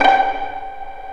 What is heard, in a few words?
Vio Pizz G4; Multisamples; Digital Sound Factory Virtuoso